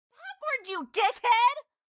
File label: car reaction; Blind Drive